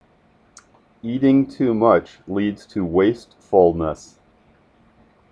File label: eating